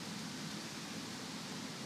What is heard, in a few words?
Ambi Loop, outdoor samples Ambience Loops